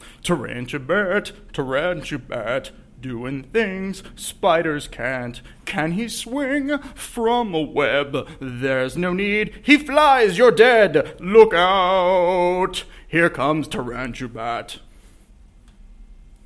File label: Sings